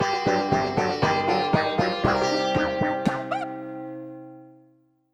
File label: Jingle, Failure; Muscle Road - Failure Phase 1; WarioWare: Move It!